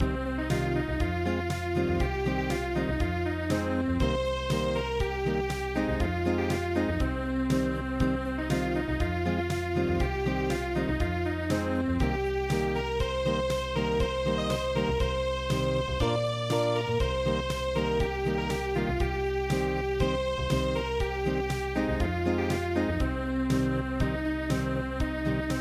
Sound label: gak seor i ta ryeong Unplugged